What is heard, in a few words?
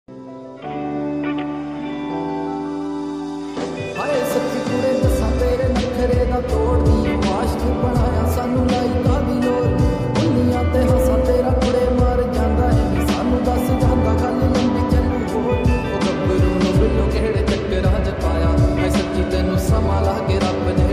song ringtone lofi version